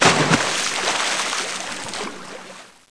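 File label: water in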